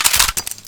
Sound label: vp pistol clipout; weapons; deluge